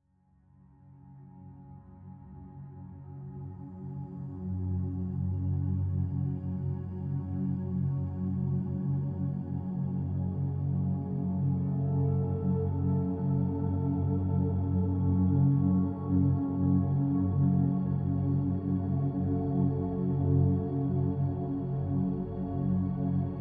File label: binaural beats sleeping music alpha brain waves